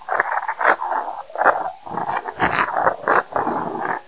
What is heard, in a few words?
stones